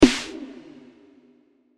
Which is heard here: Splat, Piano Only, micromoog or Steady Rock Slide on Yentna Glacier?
Splat